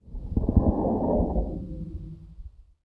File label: ambr dungeon supernatural short; Skyrim Special Edition